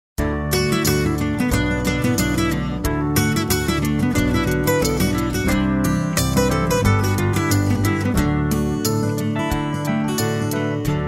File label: EZ LISTEN, Royalty Free Music; podcast production toolkit